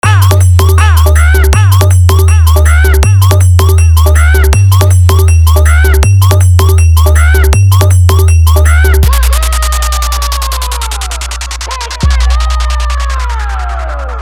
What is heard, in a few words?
Frog Humming Mix; New Style; 1Step